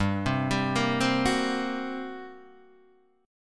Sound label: G Minor Major 7th Double Flat 5th, G, Guitar, DADGAD